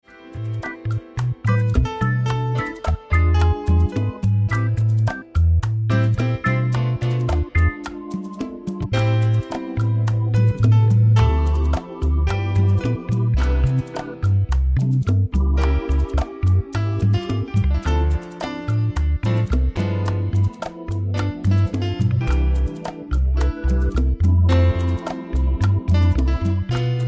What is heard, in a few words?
Dueling Keyboards